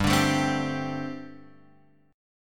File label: G Suspended 2nd Suspended 4th, Guitar Open F; Gsus2sus4 strummed; JGuitar